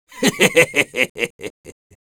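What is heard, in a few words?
Sniper laugh evil, Spanish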